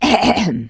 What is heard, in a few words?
cough